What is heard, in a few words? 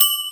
star sound; monstersunion